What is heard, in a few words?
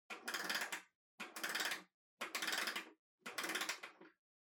Pendulum Clock Hardware Far Wind Up